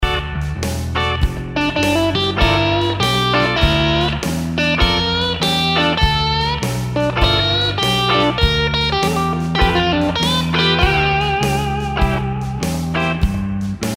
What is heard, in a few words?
Reconsider Lick, guitar